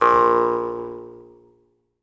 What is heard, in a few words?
Jaw Harps